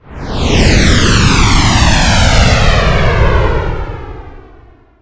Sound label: Ship Fly by short